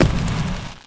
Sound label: hero attack